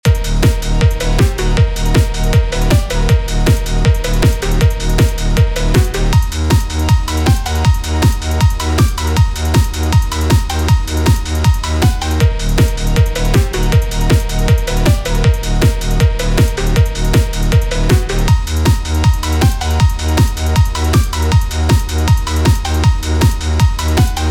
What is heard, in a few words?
F PHOONK